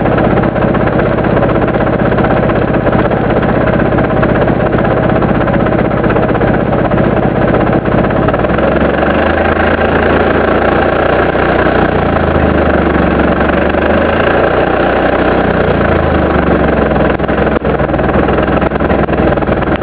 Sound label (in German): Standgeraeusch, holdereinachser